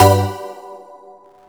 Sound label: BGM SYNTH